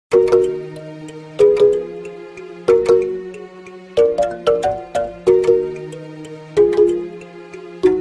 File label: Marimba